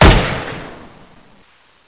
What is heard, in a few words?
shoot metal